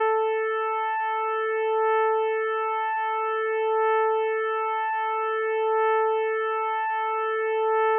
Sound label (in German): prim 440